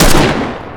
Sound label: ak47 shoot